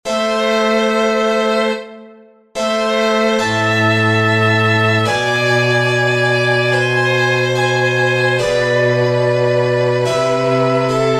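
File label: Trio Sonate C major Adagio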